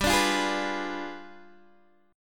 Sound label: G 7th Flat 9th, Guitar, Open D